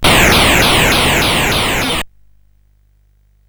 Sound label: sid CSG8580R5; hvsc